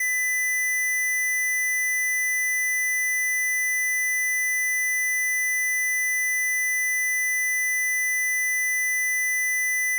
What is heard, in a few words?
Sqr 2000Hz -24dB